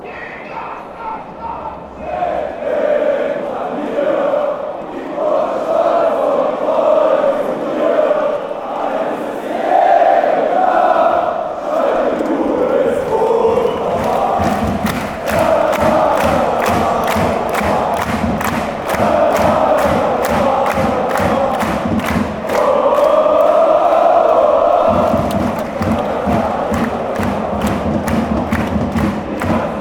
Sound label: verse la la woah, fanchants